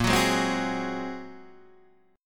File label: Bb 7th Suspended 2nd Sharp 5th Bb Guitar Open D, chord; 8,9,6,6,9,6